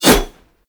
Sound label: Heavy Whoosh, Dual Daggers; Prime Okina